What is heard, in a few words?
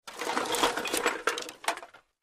Wood Search Through Garbage or Junk Pile